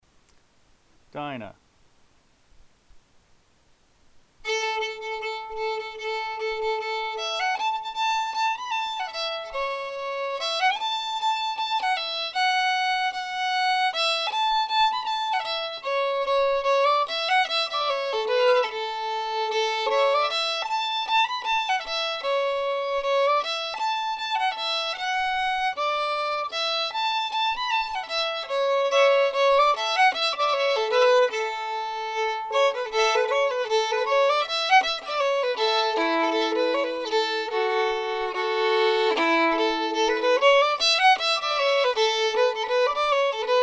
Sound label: fiddle; festival tunes